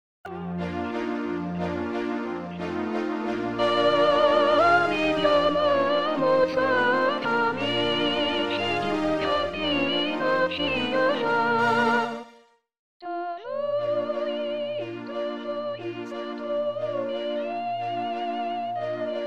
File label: Brindisi Soprano